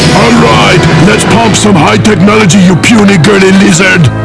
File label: pump